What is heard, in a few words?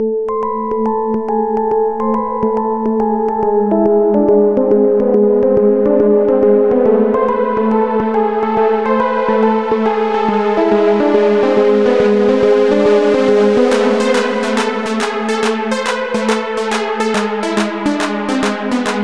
Hardstyler